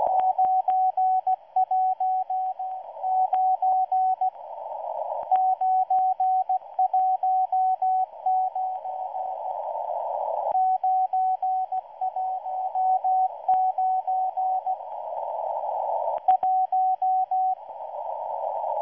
13926 kHz error restart, morse; 2016-07-07, 1310 UTC, error, restart; M12

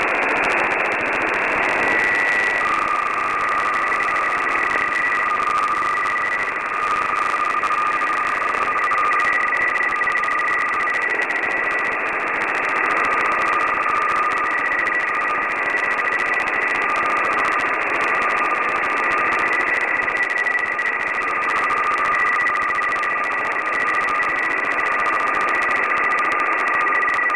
fsk100

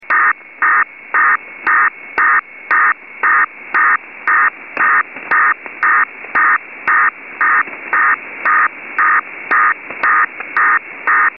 DPRK diplo DPRK ARQ; North Korean diplo: DPRK-ARQ 600/600

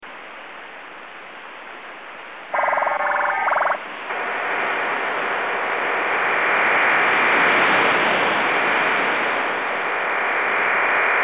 Cuban DRM

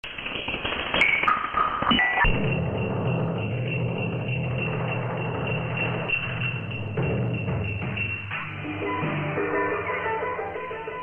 Chinese Firedrake jammer

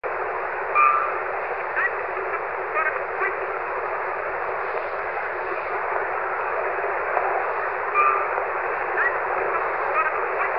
rooster; Talking clock; 11354

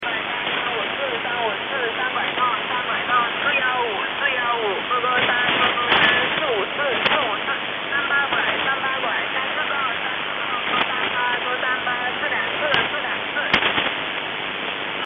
8073 kHz

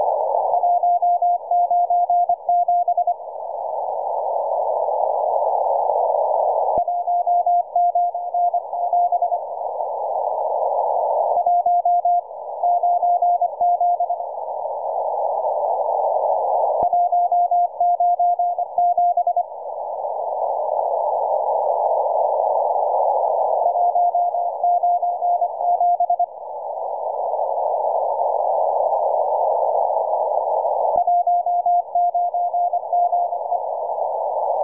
morse

